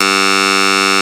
Clavinet A2

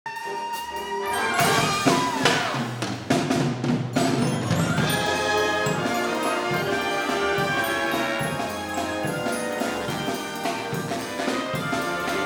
drum

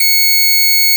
Church Org F6

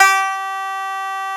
Banjo B2

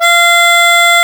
Harmonica A2

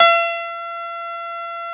Piano Db3

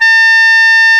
Oboe D4

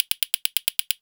Castanets

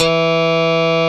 Strat G#1

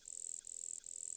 Bird Sparrow

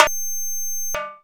Timbale Rimshot

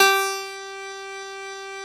Piano C#2

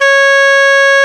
English Horn D#3

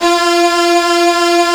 Strings G2

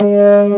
B3 Lo Dist Slow F#2

